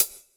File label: Electro Hi Hat; Yamaha PSR E305